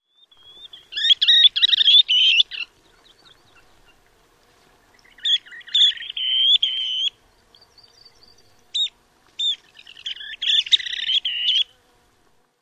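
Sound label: Least Sandpiper, song and calls number 1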